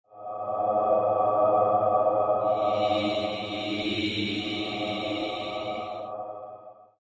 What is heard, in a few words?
harddisc